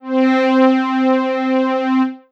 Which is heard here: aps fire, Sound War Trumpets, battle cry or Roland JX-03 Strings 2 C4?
Roland JX-03 Strings 2 C4